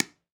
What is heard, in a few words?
Pickelball Paddle Hit Ground Light, Ball Collision